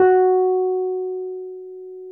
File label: Wurlitz EP F#; Sonic Reality - Vintage Timetraveler